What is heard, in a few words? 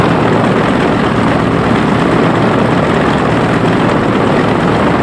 engine bomber mid; engines; War Thunder